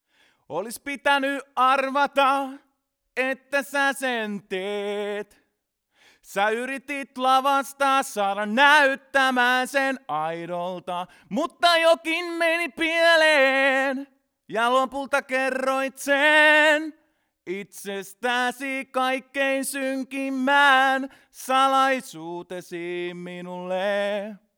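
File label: male vocal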